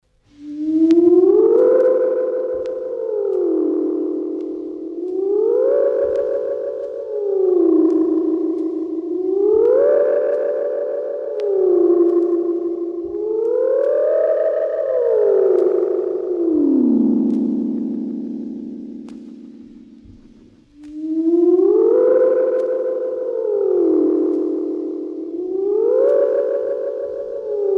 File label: ascend + descend, Electronic Sound Patterns; His Master's Voice